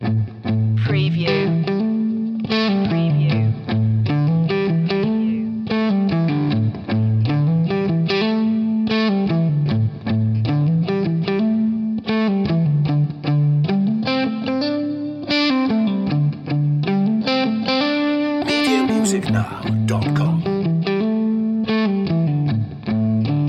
rough guitar loop

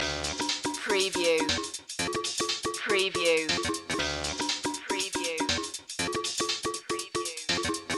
electro stomp loop

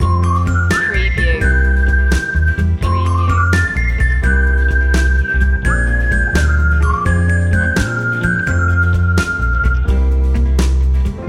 nice and easy whistle loop

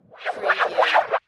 Scifi Production Element - Inwards Swirling

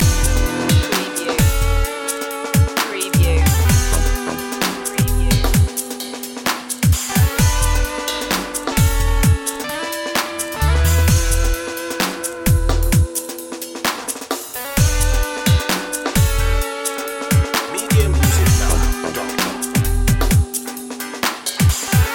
detective loop

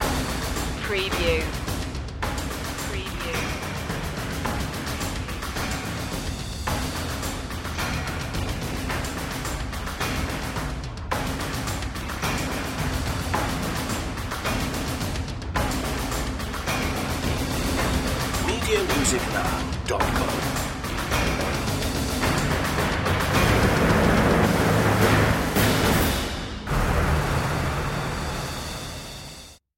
percussion only

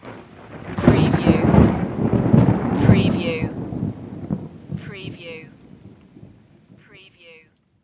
thunder

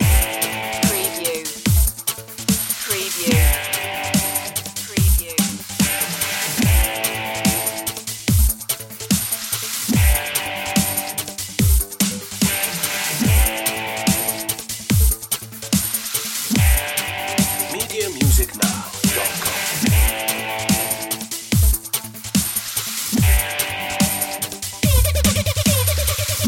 machinery loop

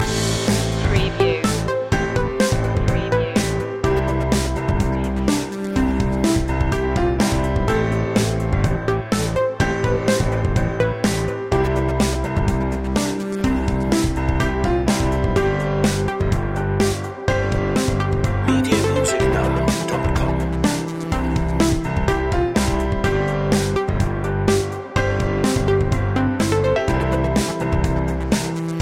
summer drive loop

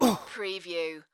Pain Umph, Male Painful Grunt, Hurt

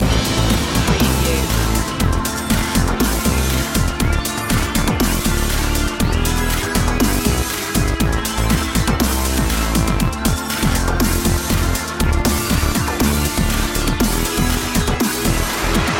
extreme sports loop

